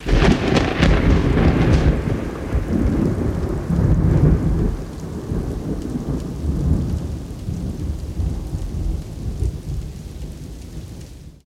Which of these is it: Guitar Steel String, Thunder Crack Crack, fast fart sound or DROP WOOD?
Thunder Crack Crack